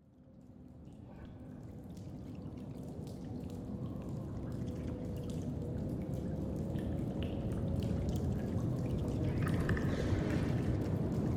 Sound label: amb cave; ambiance; environment track; caves